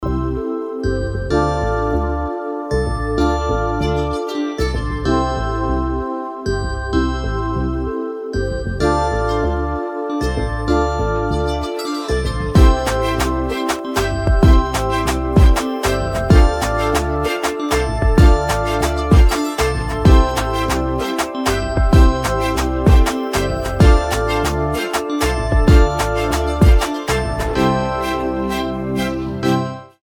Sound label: Karaoke Song